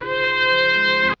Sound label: soothing horns, Stabs